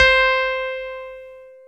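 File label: YAMAHA CP70 C2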